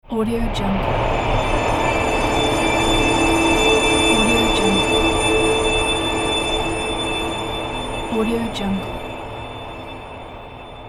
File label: drone; valhalla